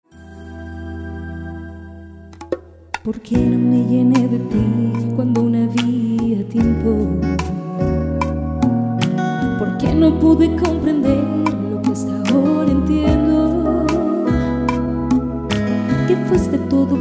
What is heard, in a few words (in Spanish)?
Bolero